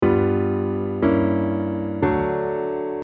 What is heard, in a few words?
D dim